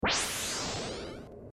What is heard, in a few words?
come to life